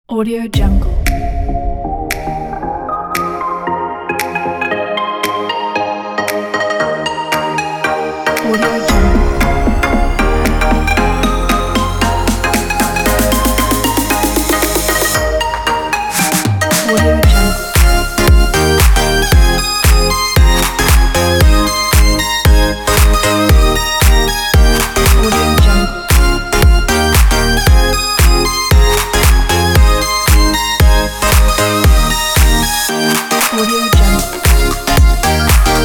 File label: tropical house